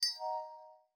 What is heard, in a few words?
Windows Battery Low; Pearl